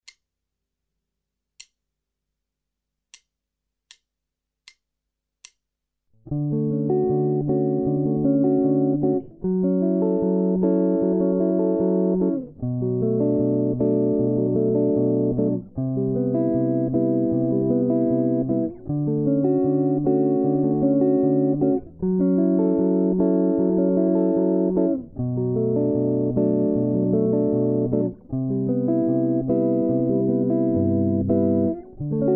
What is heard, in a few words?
guitar fingerpicking exercises jazz; fingerstyle tutorial